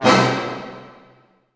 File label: F# MAJ FF, ORCH HITS, Orchestra; Sound On Sound; Peter Siedleczek's Orchestra